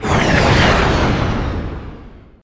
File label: do super speed; KevinBlast; marble